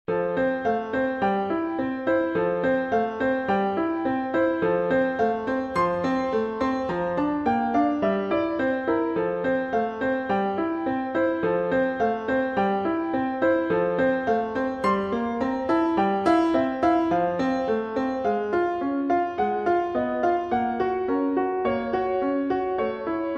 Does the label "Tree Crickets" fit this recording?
No